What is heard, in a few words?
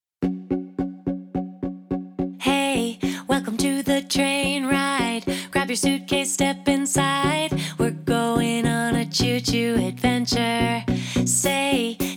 Train Song; dancely